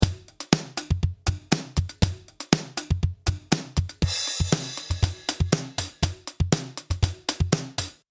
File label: Drums Opto